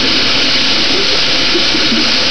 Coolant Pres Inc

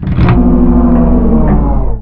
Lift Cut

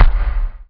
Auto Canon Action

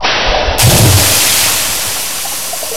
Coolant Dump

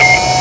All Buttons

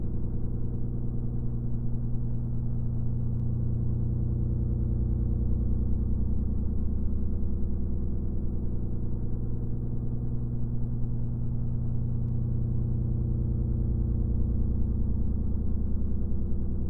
Pipe Machine

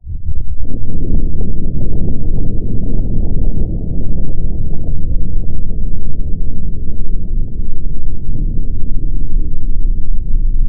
Aux Explosion

